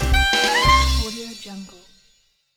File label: jazzy ta da